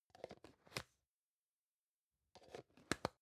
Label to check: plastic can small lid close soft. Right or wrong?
Right